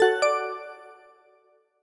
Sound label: notification event, Sony Xperia M4 Aqua notifications